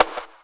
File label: Snare; Vengeance Samples